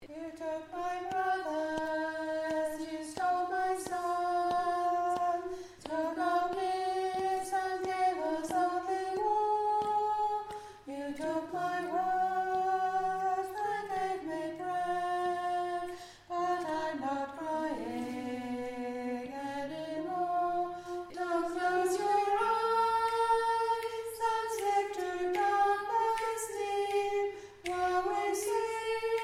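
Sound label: Soprano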